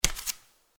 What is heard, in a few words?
Footsteps Barefoot One